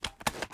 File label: reload empty fast start; reloads; gmod